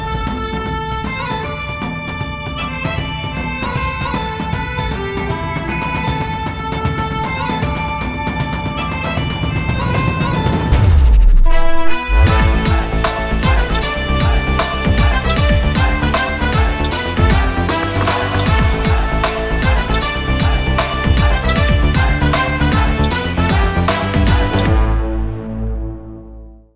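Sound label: Long Ringtone Mass background music; DEVARA; NTR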